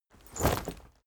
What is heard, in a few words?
leather armor movement